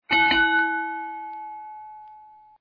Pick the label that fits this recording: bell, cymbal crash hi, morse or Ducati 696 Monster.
bell